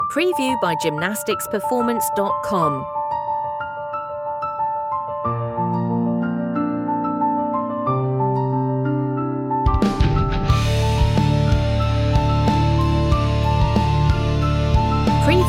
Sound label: opera rock